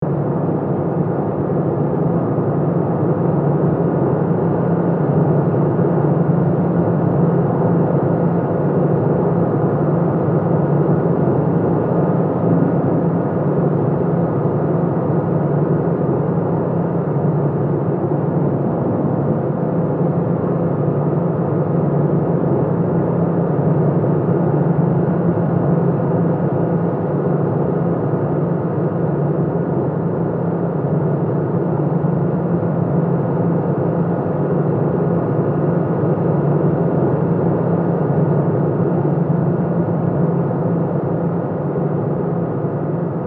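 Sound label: Ambience General Med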